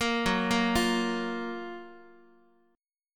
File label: F sharp Major 7th, Guitar; F#M7 Chord; arpeggiated; x,x,1,4,2,4